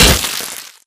imp bullet generic headshot android; Titanfall 2

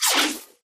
imp bullet generic titanshield; Titanfall 2

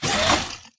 Mvmt Servo Light Short

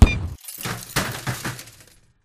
Drone Death Explo Close; Titanfall 2

